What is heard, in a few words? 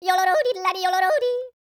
YODEL, World Voices; ILIO; Vocal Planet